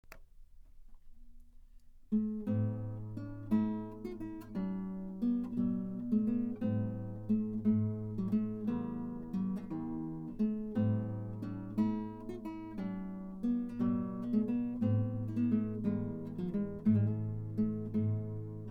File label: guitar